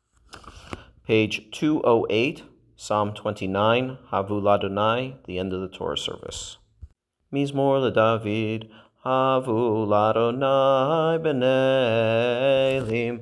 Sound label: Psalm 29 Havu Ladonai